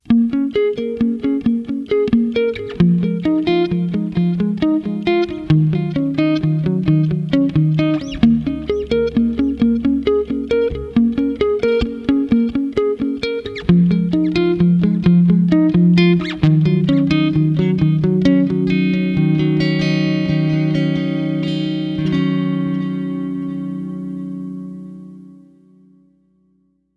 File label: guitar; pillbug